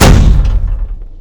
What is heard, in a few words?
avre shot churchill; WAR